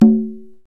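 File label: perc tumba, deep congas; Roland LCDP14 Africa VOL-2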